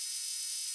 Menu Zoom Loop; Menu FX

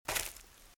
Footsteps Leaves Walk Right; RadwinIsland

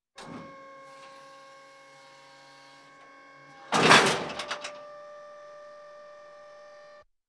Military vehicles

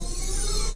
move; Apotheorasis

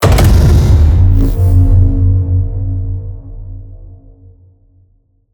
HIT SciFi, Cinematic Hits Designed

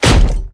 door kick; DeadFright

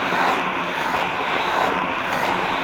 Roller Skate Loop; Misc Sports

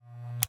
Electronic, Latch, click, heavy, clippers, off; Eiravaein Works; Vaeyan IV

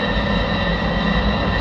Sci-fi UFO Loop; SFX Kit; Sci-Fi Loops

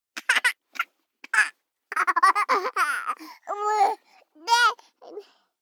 BABIES LAUGH Boy 12 months Stutter; Baby Boom

